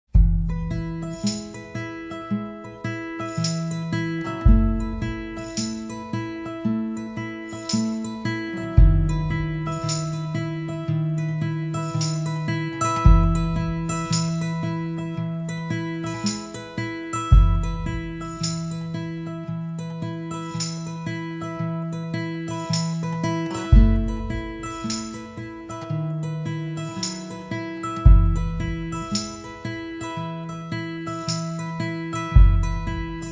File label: Instrumental